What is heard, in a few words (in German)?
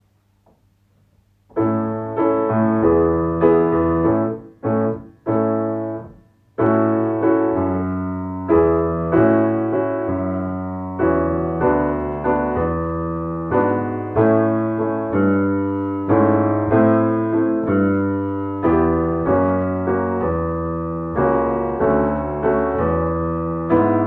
Klavier